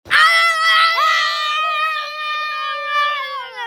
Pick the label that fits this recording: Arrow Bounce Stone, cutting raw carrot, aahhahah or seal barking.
aahhahah